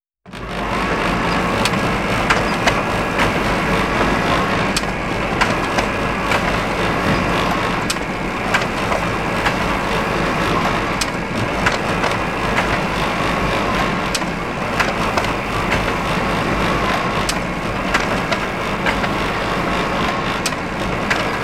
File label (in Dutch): kopieerapparaat oud model